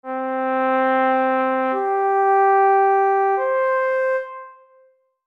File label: gedolah; AJ Hipkins